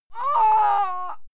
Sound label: female fight groan pain; schreie; menschen